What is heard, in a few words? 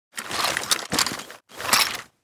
weapon pickup; weaponweights content